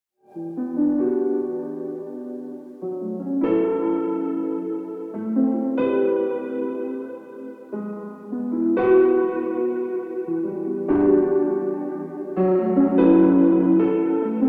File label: Nostalgia Lofi Piano E Minor